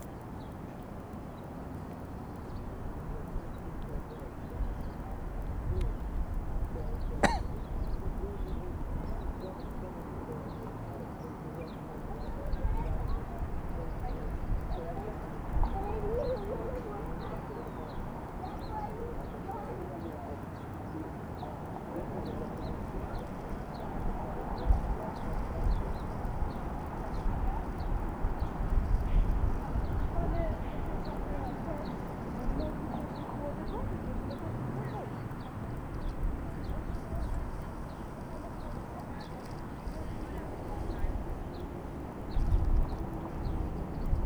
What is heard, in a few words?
bells st james cathedral toronto